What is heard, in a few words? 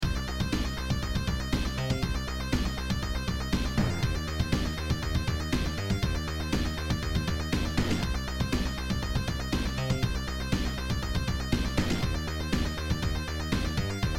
bass basstone lead sound beat